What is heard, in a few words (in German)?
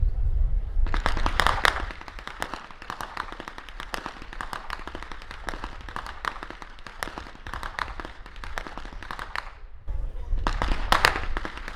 schnalzen; SAALDORF III; saaldorf surheim freilassing